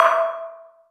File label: digi pizz; analogBully; Ableton Foundation FreePacks; Wicked Kits